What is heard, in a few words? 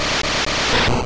Mega Punch; sfx; attack moves; pokemon; gen 2; gsc